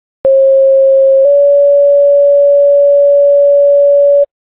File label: page, tones